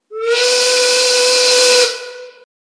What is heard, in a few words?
Steam Whistle